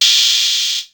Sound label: Open Hat, Ensoniq ASR-X Pro